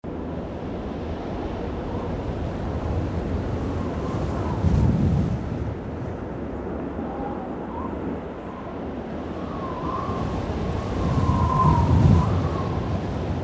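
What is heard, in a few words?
WIND Summit Fierce Gusts loop; the unfinished swan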